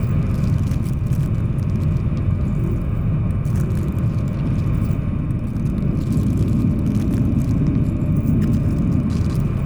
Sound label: pro ship strikecraft idle; biogenesis